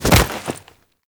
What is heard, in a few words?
Finisher Grab; Kuva Lich; Warframe